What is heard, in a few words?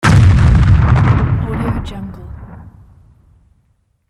explosion